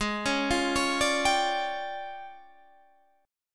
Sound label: D Minor Major 7th Double Flat 5th, G sharp